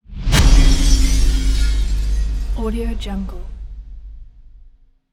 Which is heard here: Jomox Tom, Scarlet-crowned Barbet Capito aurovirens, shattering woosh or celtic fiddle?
shattering woosh